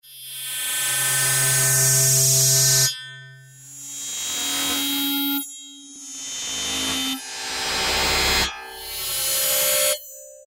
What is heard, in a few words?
Yamaha TX81Z; Spc Midiot